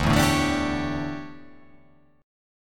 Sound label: D Major 7th Suspended 2nd Suspended 4th D down